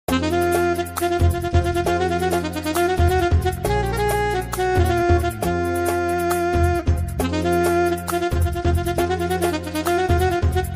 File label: song bgm instrumental music